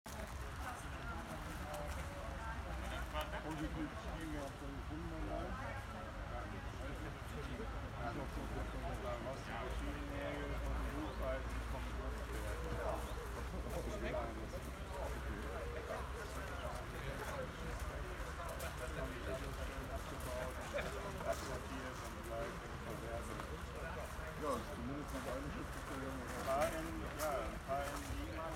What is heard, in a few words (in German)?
Nach der Ankunft Bf. am Haseschacht wurde etwas gefeiert